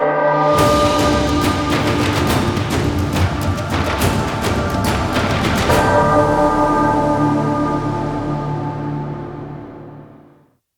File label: Mission Failure Cue; Warframe; Cues